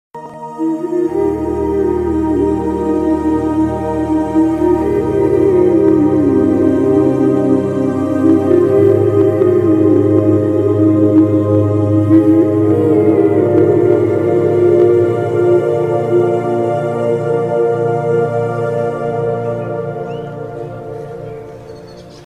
emotional ringtone south emotional ringtone emotional bgm; shyam singha roy